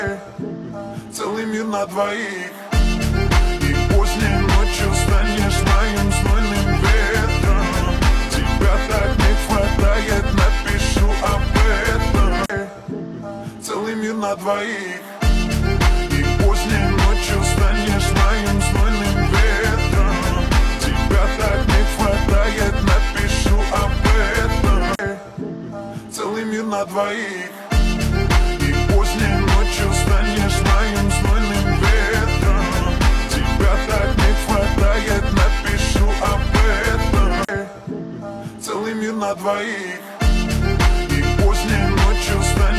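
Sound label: Lezginka Accordion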